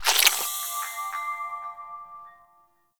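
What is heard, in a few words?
Power Shroom Projectile Pulse; Warframe; Nokko; Empowering Mushroom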